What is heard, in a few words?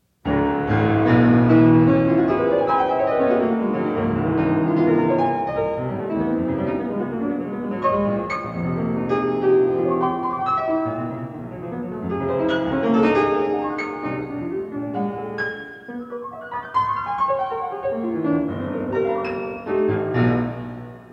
Three Pieces for Piano III Feroce; Tobias Picker; Emanuel Ax